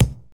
natural bass drum single shot b key, kick sound tuned to the B note